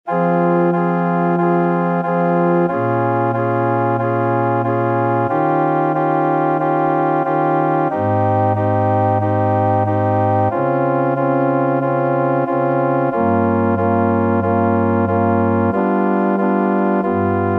Ave Maria Saxophon Solo + Orgel Playback

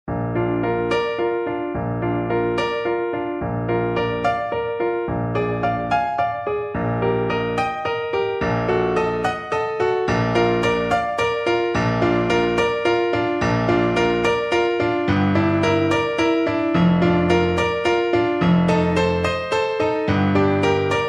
Ave Maria (Querfloete Solo + Klavier-Playback)

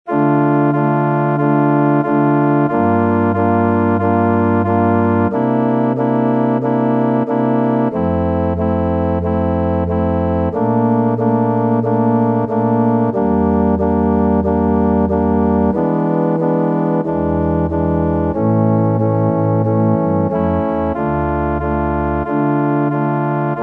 Ave Maria (Violoncello Solo + Orgel-Playback)

